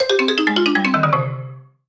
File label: MUSIC EFFECT Solo Xylophone Negative; startide macos switch windows gamerip 2017